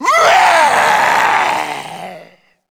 gorefast chase; kf2; gorefiend; slender

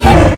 thumper idle; lethalcompany; zerosiki; slender

